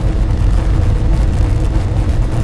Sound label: cairn pipe, ambience